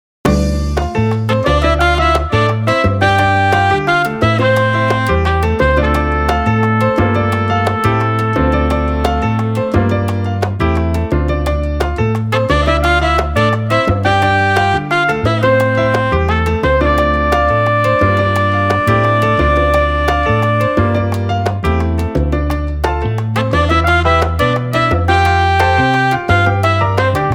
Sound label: FESTIVE RHYTHM